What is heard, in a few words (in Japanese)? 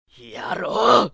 dame kyou, ダメージ強; ゲーム用フリー音声素材集; 上記と同コンセプト。声高め。; 軽薄なヤンキータイプかしら。; ポケットに手突っ込んだままとかそんなモーションでもいいかも。